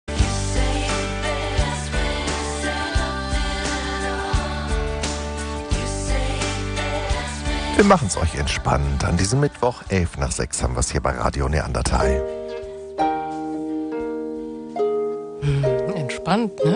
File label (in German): harfe; aussergewoehnliche hobbies harfe spielen